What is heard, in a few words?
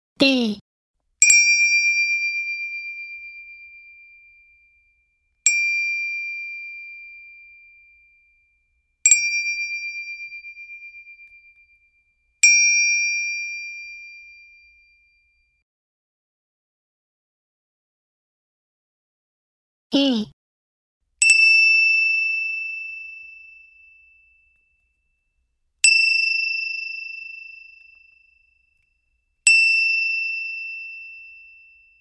handbell